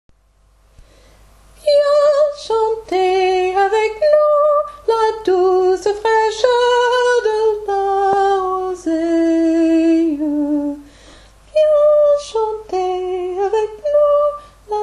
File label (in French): soprane voix